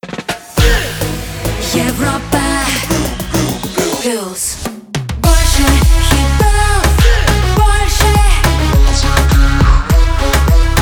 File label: Jingle; Europa Plus